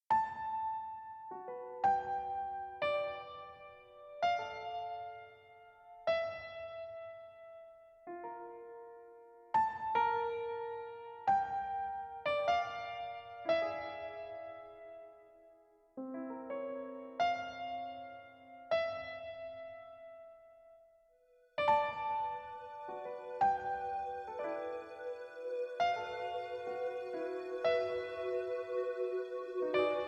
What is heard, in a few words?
slow piano; weeklybeats-2014